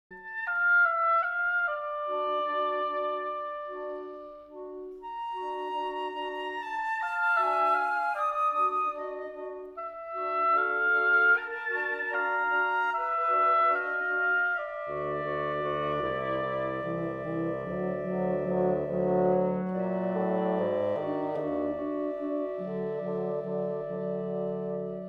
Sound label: Winter Music Andante